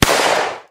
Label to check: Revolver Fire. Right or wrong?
Right